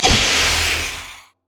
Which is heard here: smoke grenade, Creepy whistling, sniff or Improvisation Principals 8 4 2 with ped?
smoke grenade